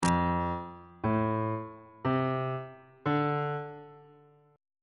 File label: f major 6th chord intervals